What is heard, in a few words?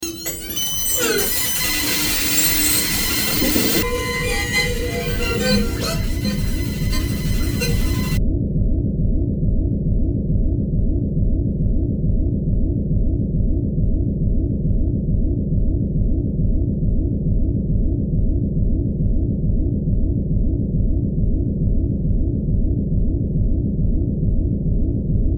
noise